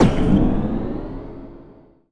noise fx; Masterbits; Switch FX

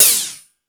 Lazer Drums, drum machine; E-MU Pro-Cussion-1

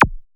JX HI Q; HIQ+ZAPS

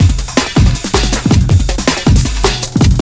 JUNGL 160, JUNGLE LOOP

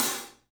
SABIAN HAT; 3D Sound; 3D Drums

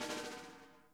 SNARE, Interactive Drum Kits

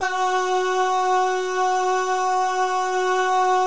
BAH GUY, Vocal Planet Jazz & FX; ILIO; BAHS GUYS

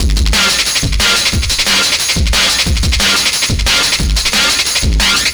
distorto, drum & bass beats & breaks; Fluid Dynamics

